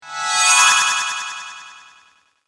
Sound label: Casting Spell, Magic Sound Effects